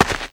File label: walk land, FootStep; WarRock